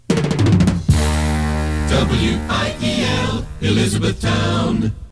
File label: jingle